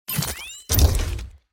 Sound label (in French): galet eclatement; La-Fabrik